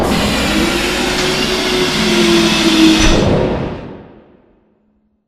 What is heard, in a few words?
Assembly Plate Lift Lower Metal; Boomtown; Titanfall 2